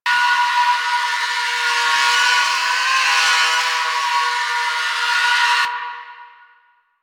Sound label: Weave Boss Spirit Three Loop; Warframe; Cephalon Weave